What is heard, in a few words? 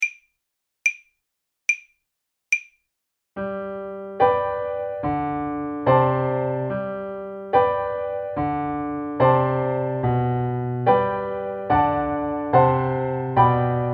marche kohler acct 72, basson